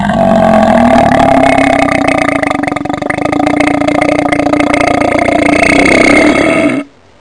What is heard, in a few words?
long burp